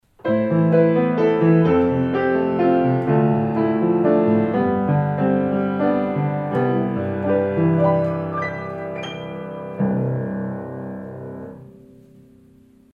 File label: Sonic Port VX Piano Mono Gain10 1m